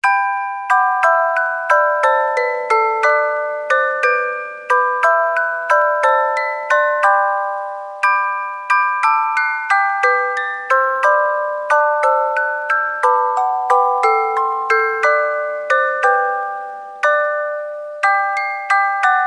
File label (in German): Spieluhren, Weihnachten; Bring A Torch